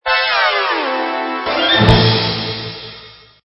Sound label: Stinger; STINGERS